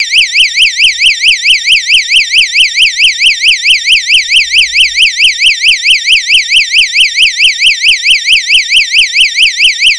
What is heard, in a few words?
alarm